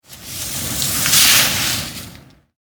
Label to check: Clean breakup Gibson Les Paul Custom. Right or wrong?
Wrong